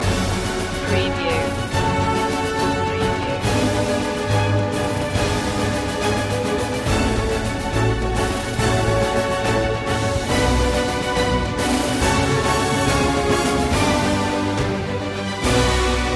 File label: no choir